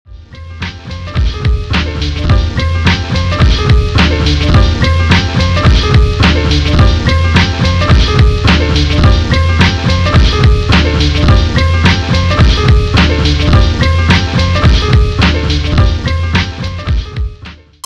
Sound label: Interlude Jingle